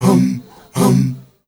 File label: MALE HUM; M.HUMMERS; Spectrasonics; Heart of Africa